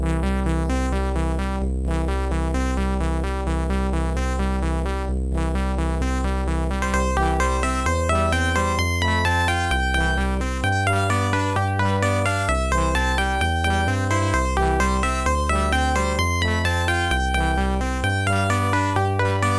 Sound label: music box; Sonix Music Driver